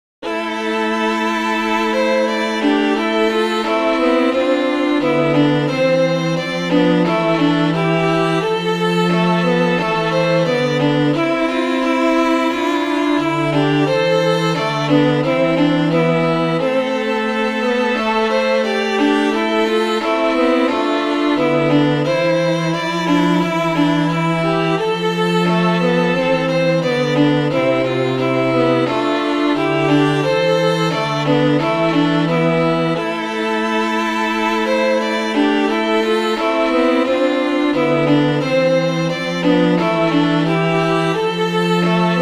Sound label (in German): augmentationskanon